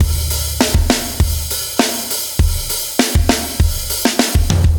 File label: Walker Drums, metal метал